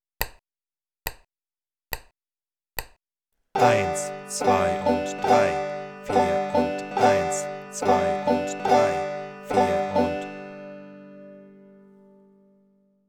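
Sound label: bum ditty, banjo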